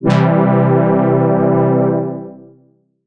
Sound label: Lush Pad Chord, Old Skool House; Big Fish Audio; OSH Chords